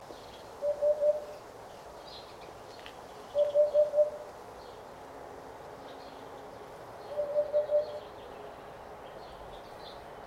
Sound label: Eurasian Hoopoe Upupa epops; chant des oiseaux des jardins